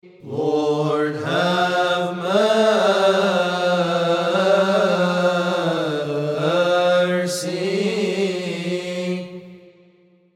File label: Lord Have Mercy; Seven Short Litanies